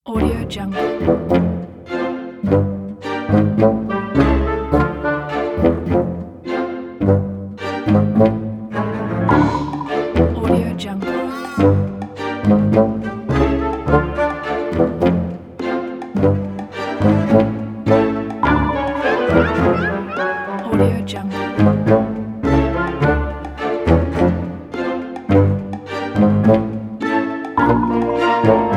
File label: funny